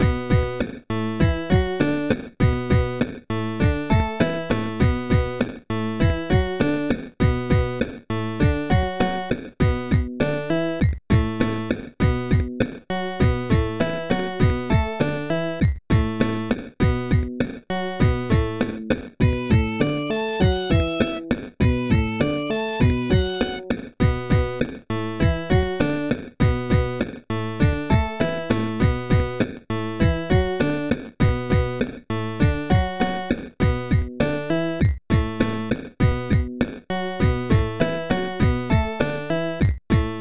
super scary cave dragon, chiptune music

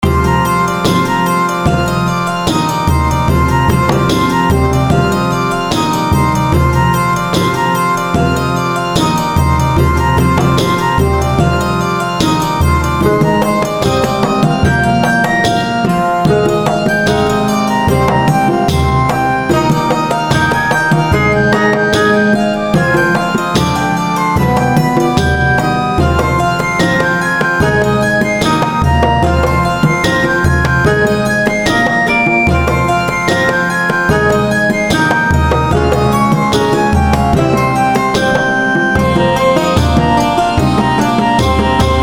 Loop Market Day

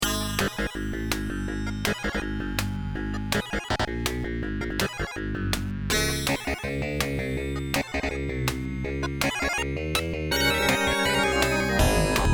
chaos factory medium loop

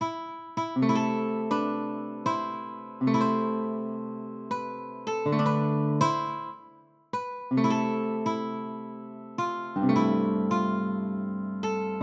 calm theme

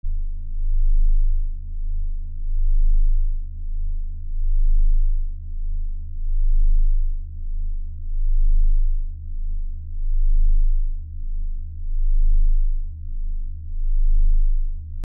SCI FI Computer room ambience

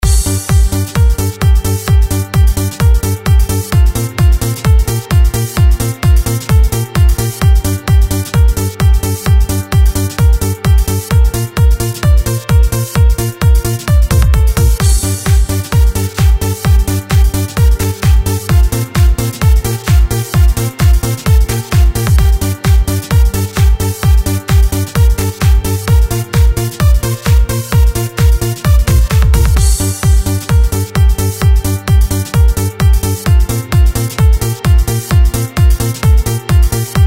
A walk in the sky, 130 BPM

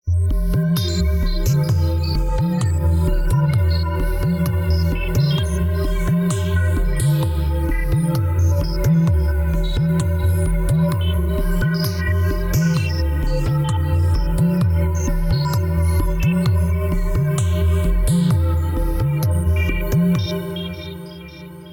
game loop, Space scene music; Warplanets

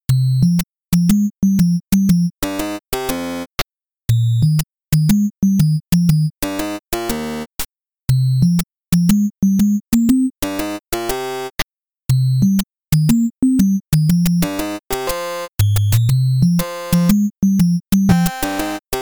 odd store

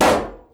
balloon pop

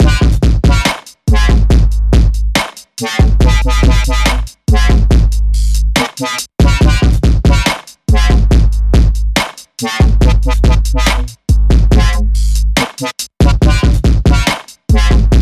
wub a dub dub dub

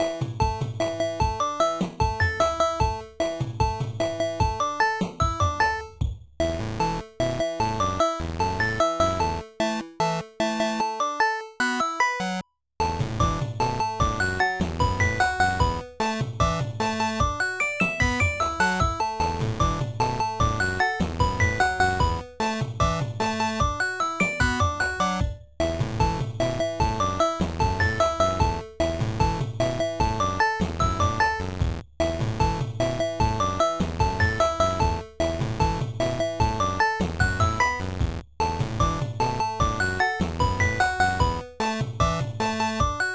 chiptune

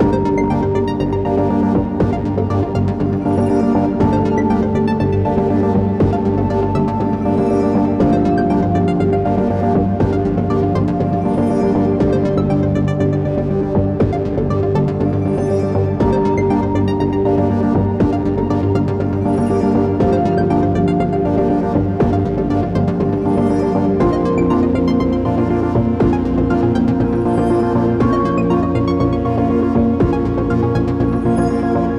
dark things loop

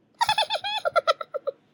laugh

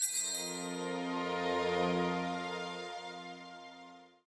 chase over (success), Music piece

